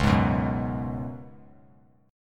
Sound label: Db 7th Suspended 4th Db down; JGuitar